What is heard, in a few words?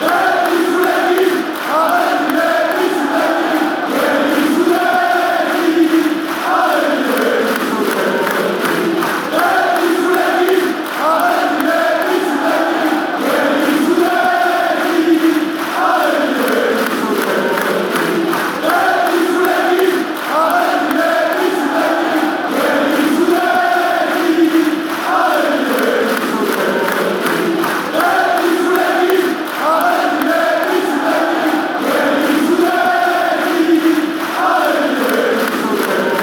chant, fanchants; athletic